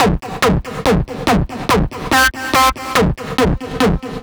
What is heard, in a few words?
Hard Kick